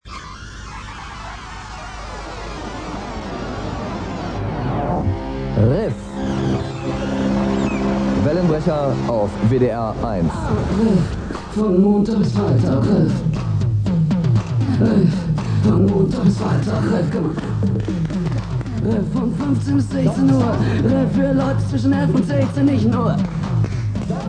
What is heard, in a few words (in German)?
riff lang